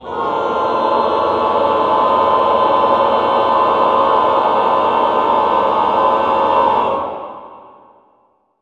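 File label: choir cluster; Sound & Vision - Gigapack I CD 2 (Roland); Cluster 1-9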